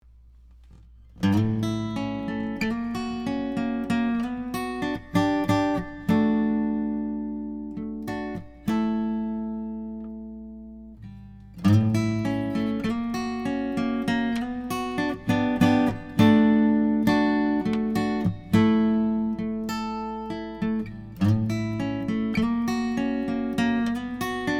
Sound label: Guitar